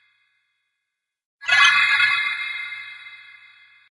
alien notification

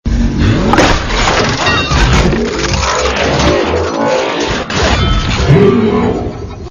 transformers transforming

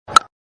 cricket bat hitting sound

